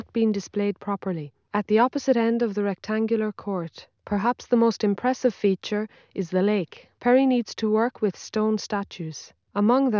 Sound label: female